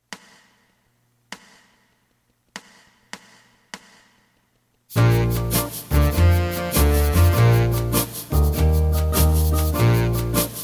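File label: Asax 1st 2nd, alto saxophone 1st 2nd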